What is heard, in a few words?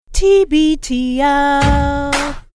singing jingle; tbtl